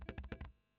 E. GTR Royer OD

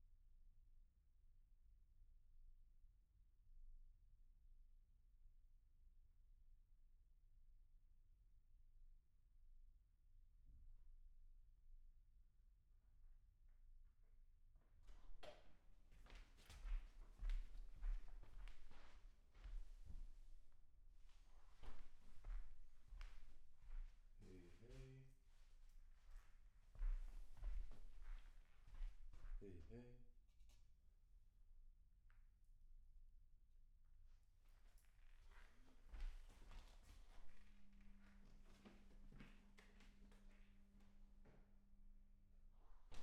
ROOM

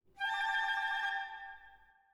Orchetra

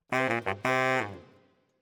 Bari, RNR for piano

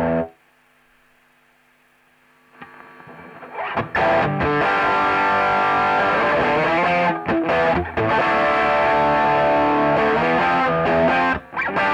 E Gtr

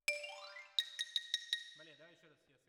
Xylo

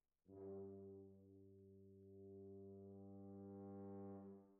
Trumpet

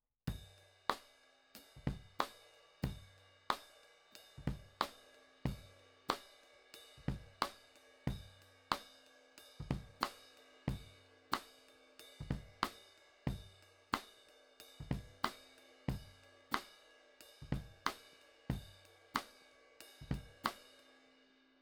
KIT

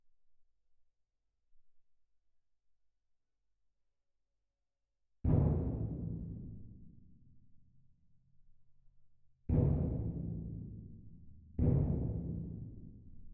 Perc

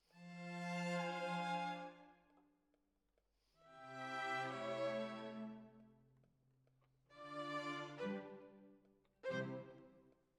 Violins, jazz for piano

